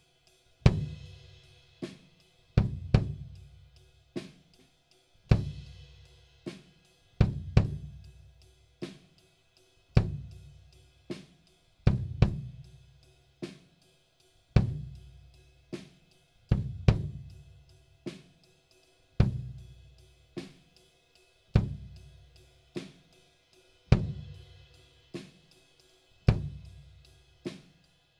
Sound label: Kick In